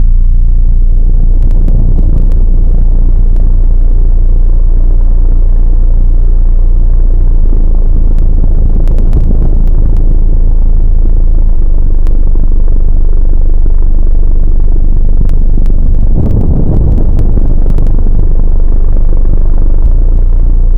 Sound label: ambience dark